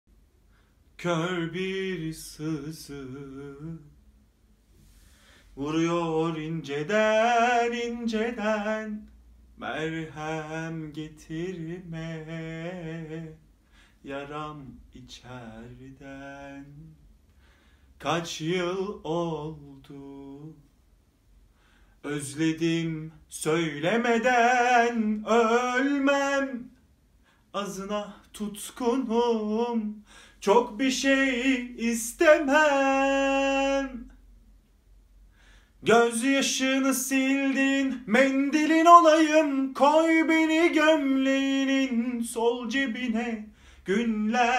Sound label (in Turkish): cover turkcepop